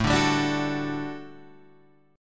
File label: A 13th Suspended 4th A, guitar chord; strummed